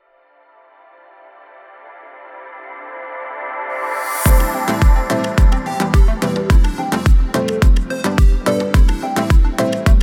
regaetone tropical; By Burak Sound Bank; reggaeton tema peketleri vol 2

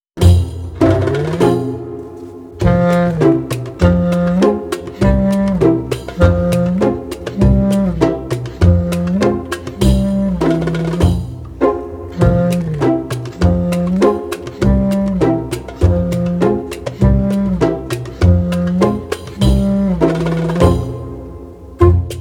sweetness pizz alaturka; by burak sound bank; cheerful vol 14

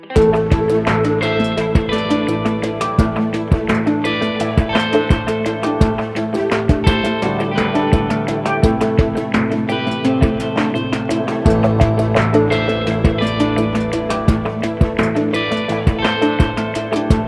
tension oceani guitar vers; gerilim; By Burak Sound Bank; tension vol 21

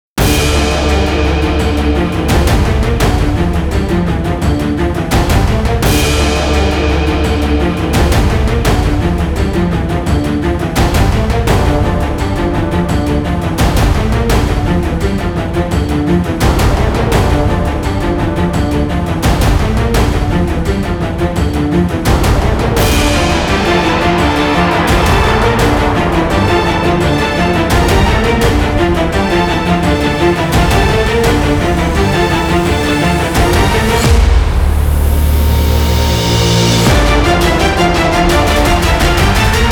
tension; by burak sound bank; tension gerilim vol 37

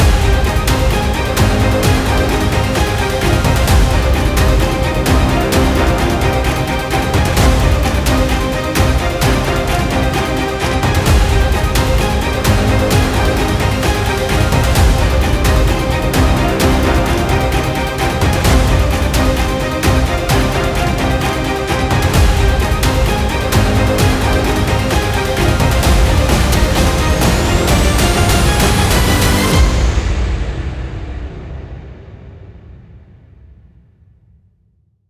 tension; By Burak Sound Bank; horror excitement thriller song

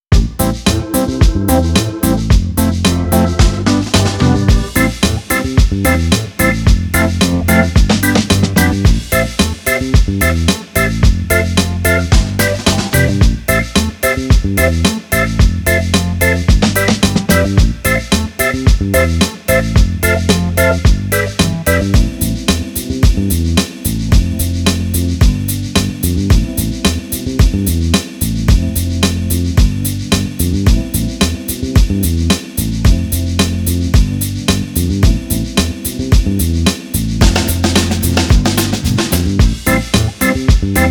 sweetness; by burak sound bank; cheerful vol 2

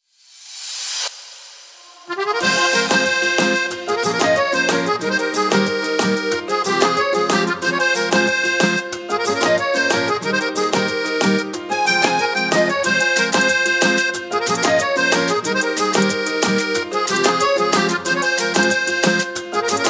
ispanyol accordion, reggaeton; By Burak Sound Bank; reggaeton tema peketleri vol 1

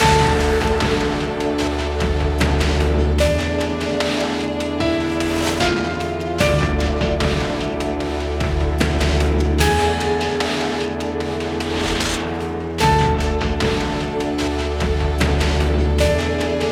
dramatic; By Burak Sound Bank

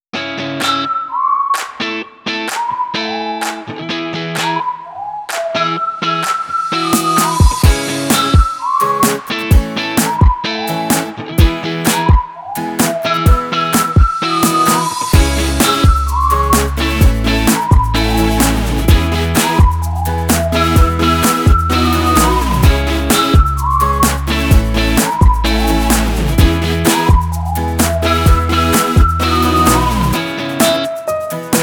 cheerful whistle; by burak sound bank; cheerful vol 5

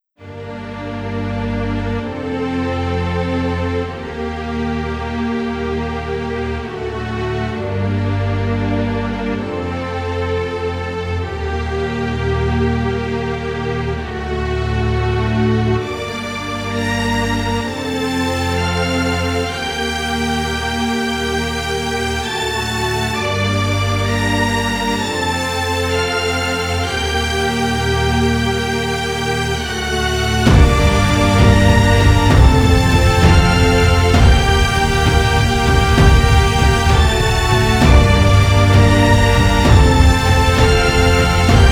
dramatic epic; by burak sound bank; dramatic dram vol 11

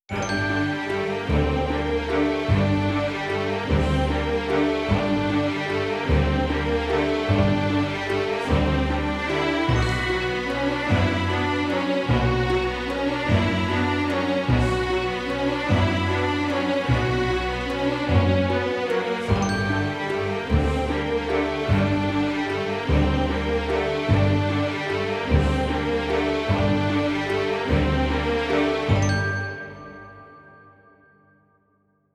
enigmatic mysterious; By Burak Sound Bank; epic tema paketleri

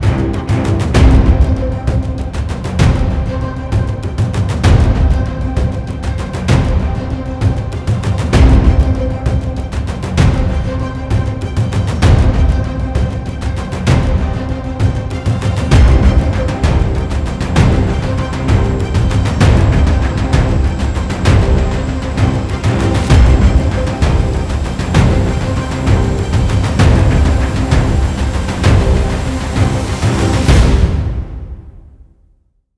violin composition, tension; by burak sound bank; tension gerilim vol 4

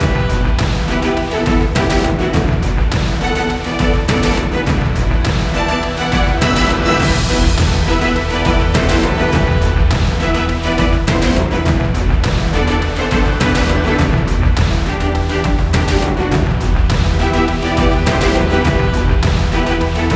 tension; by burak sound bank; tension gerilim vol 44

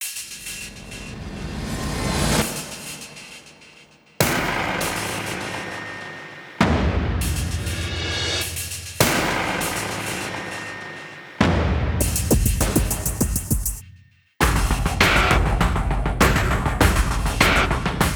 tension; by burak sound bank; tension gerilim vol 39

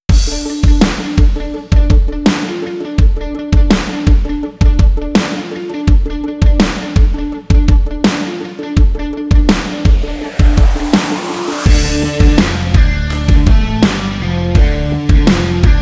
tension; By Burak Sound Bank; tension gerilim vol 23

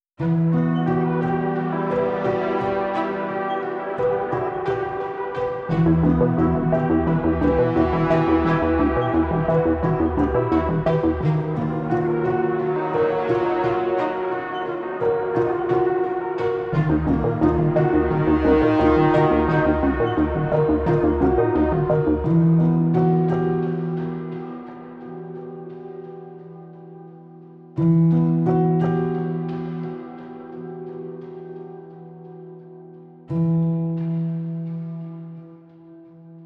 enigmatic mysterious; By Burak Sound Bank; epic tema paketleri

